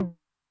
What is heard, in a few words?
HI TOM, High Tune Short Decay, 909; classic machines